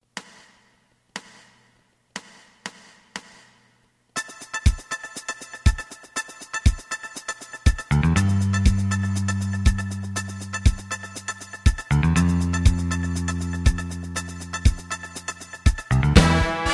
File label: lead